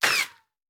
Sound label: Bullet Ricochet; Sound Ideas; Guns